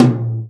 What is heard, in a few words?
tom, Real Drum Kit, jungle kit